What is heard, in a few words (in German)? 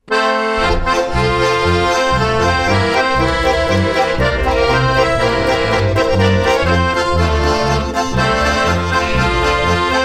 Schiffsfegerpolka